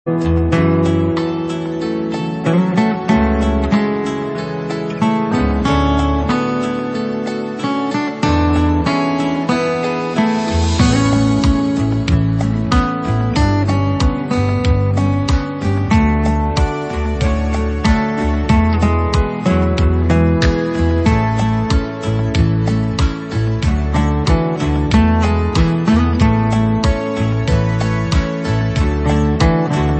The Guitar Ballad; Commando

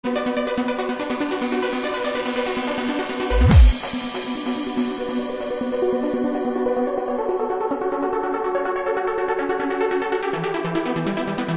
tranced

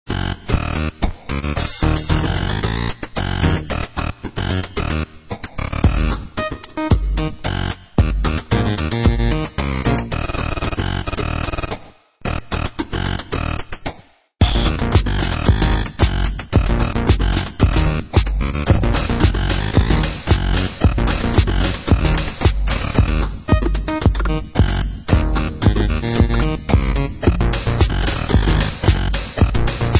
Break Dance

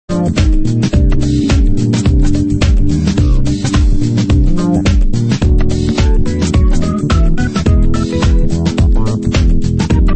modern fusion

